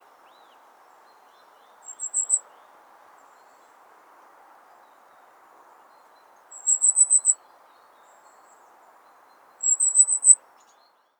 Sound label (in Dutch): vuurgoudhaan roep